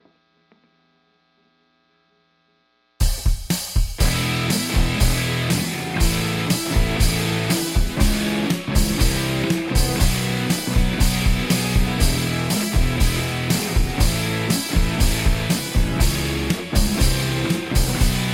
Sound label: instrumental powerpop fuzz